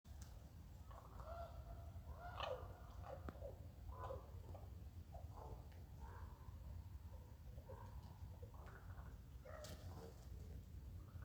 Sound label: Raven Call Dominant Knock